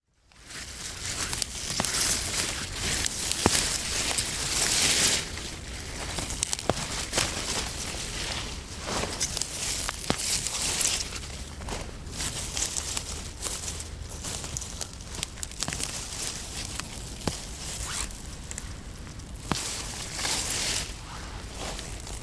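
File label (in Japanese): nozawana no shuukaku, harvesting nozawana; 野沢菜の収穫; Chino Cultural Complex; 茅野市民館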